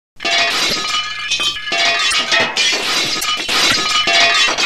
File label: glass breaking sound effects